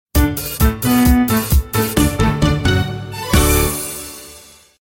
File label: funny cartoonic music tone ringtone